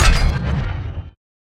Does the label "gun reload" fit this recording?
Yes